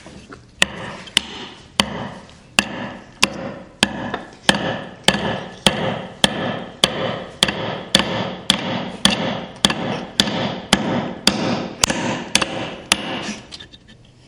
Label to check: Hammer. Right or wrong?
Right